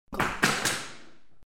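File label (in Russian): выпала рапира на пол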